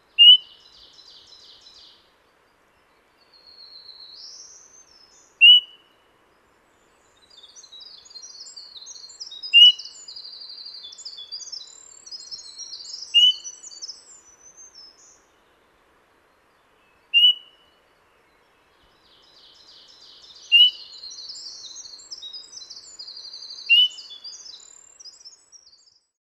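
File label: sounds of thrush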